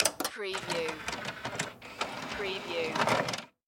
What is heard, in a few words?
CD Player Turn On; Electronics / CD / DVD Players